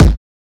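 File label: BASS DRUM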